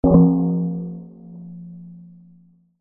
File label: BANGS